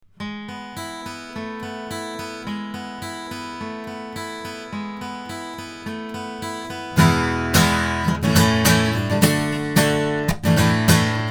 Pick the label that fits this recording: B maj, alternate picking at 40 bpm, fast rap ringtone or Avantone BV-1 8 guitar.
Avantone BV-1 8 guitar